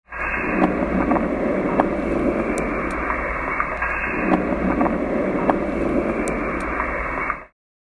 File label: Volcano